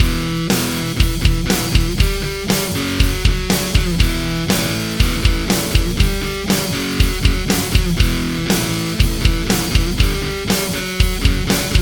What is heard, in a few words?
Hard Core Loop